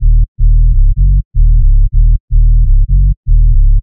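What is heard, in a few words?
Sub Staccato Loop, 125, A minor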